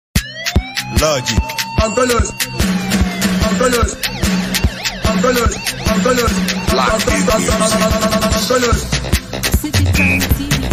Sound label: Beat